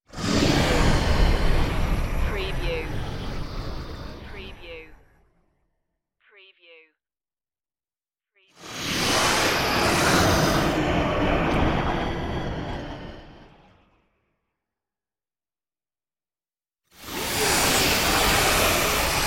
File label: turbulent future swooshes